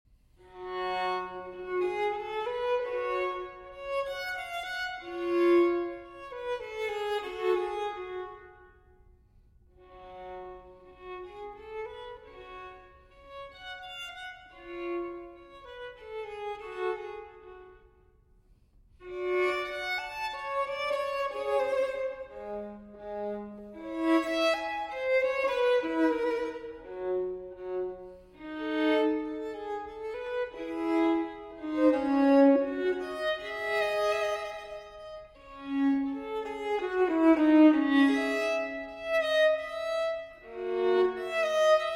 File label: Solo Violin in F Major Soave; No. 11; TWV 4024; Telemann Fantasia